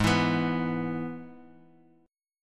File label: Ab Minor Sharp 5th Ab, Guitar Open F; strummed; x,3,4,6,x,x